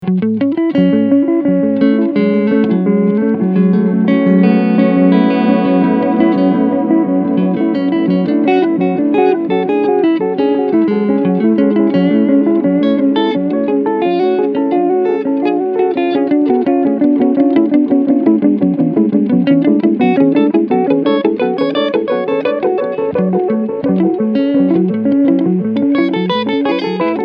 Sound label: 8 note medium delay